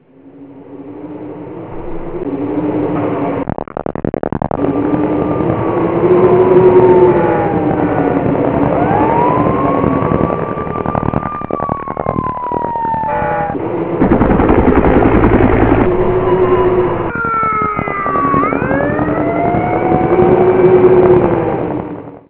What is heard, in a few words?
sirens guns wind